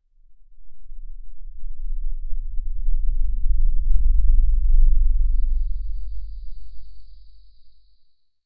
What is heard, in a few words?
smooth airy whoosh of a; organic